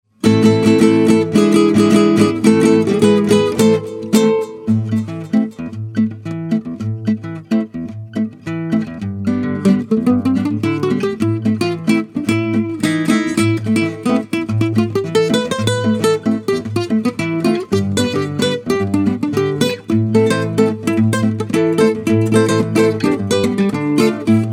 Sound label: Tango